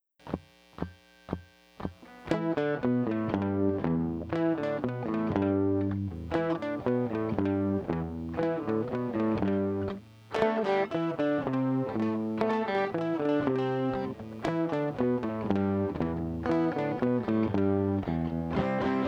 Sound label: riff